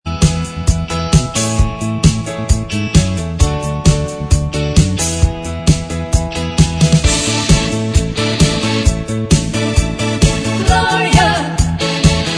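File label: backing vocals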